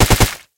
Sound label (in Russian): priglushennij zvuk vistrelov iz avtomata